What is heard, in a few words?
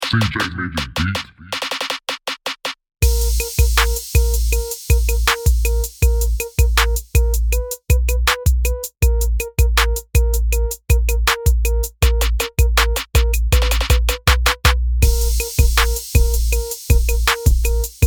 Hard Knocking Groove